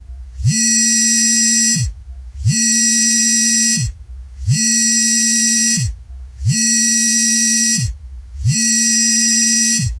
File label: vibration of mobile phone